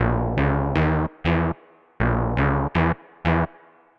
SH101 Bass Loop (120BPM), retro house

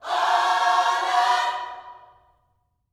OH LORD, Gospel; Spectrasonics; Vocal Planet; GOSP PHR

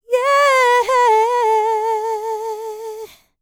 LICKS, Vocal, R&B; ILIO; Dance; LT R+B

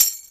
Nother Tam, Drum Machines; EMU Planet-Phatt

